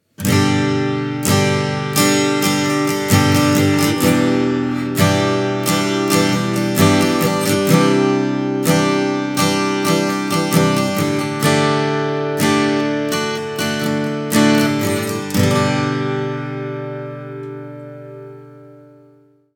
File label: STRUM